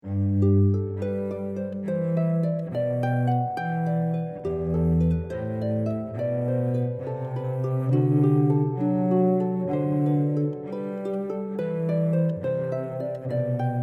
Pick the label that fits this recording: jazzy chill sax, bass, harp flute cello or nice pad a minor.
harp flute cello